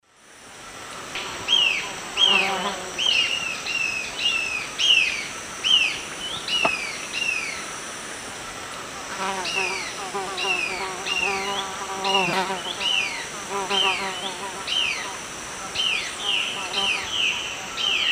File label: Galbalcyrhynchus leucotis, calls 2 birds; S. America; Eastern Lowlands